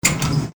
door lock

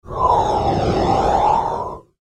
airy smooth phase

